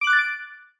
button

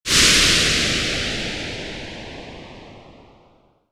steam

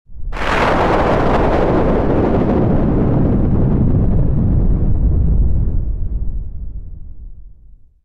thunder

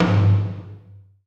drum

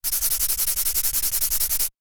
rub

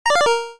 button

